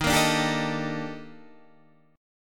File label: D sharp Major Sharp 11th D sharp Guitar DADGAD, chord; D#M#11; strummed